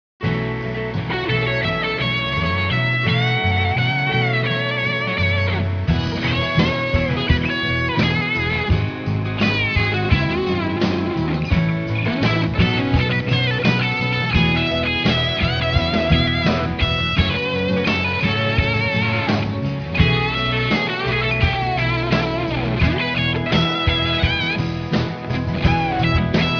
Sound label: On Hold Jazz